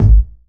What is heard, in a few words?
round kickdrum sample g sharp key, steel kick drum sound tuned to the G# note